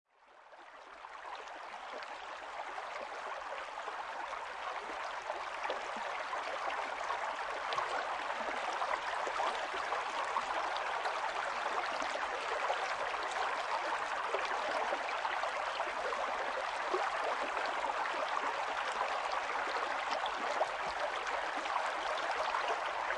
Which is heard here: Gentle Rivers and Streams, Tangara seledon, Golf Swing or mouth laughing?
Gentle Rivers and Streams